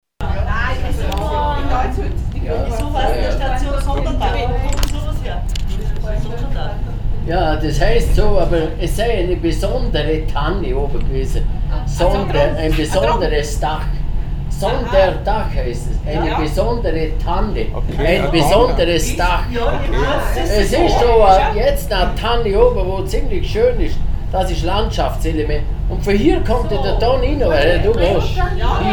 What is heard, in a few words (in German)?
Gondelfahrt, Vorarlberg; Sonderdach